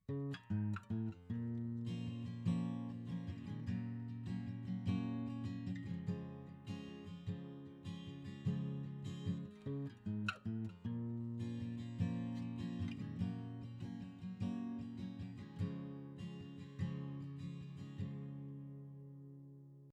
beyerdynamic M70 Pro X acoustic guitar microphone

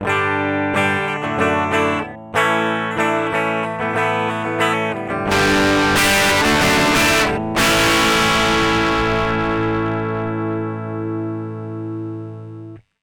BOSS DS 1 clean vs low distortion level

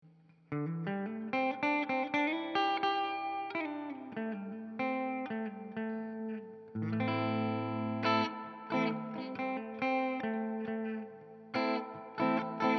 Shure MV7X electric guitar microphone

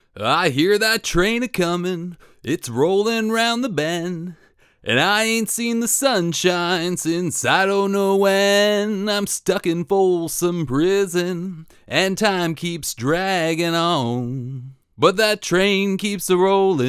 Vocals no guitars; neumann u 87 ai

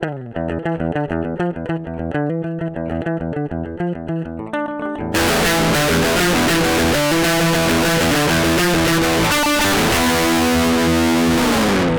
clean vs high distortion level; BOSS DS-1

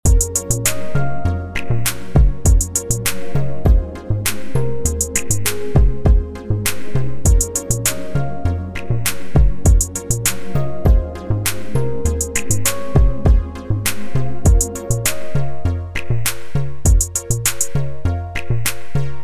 Pop sample; AKAI Professional MPK Mini MK3

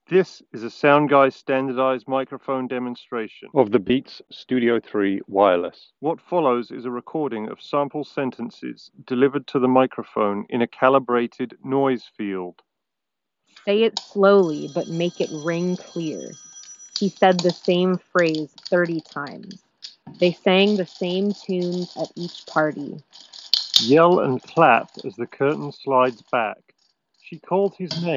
office microphone demo; Beats Studio3 Wireless; Beats Studio Pro

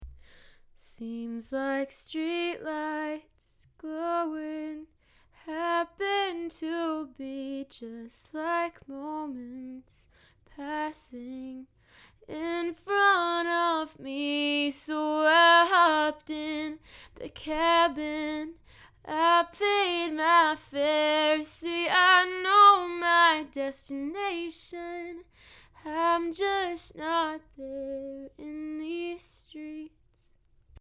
Neat Bumblebee II singing microphone sample

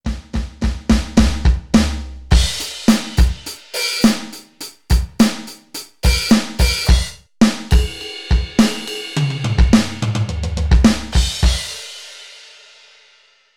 Standard kit drum test; Donner DED-200

